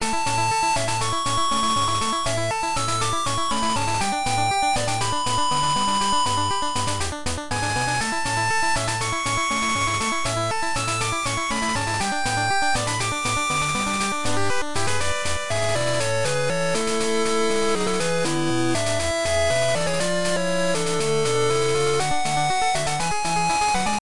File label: Route Music; RouteMusic7; P3D-Legacy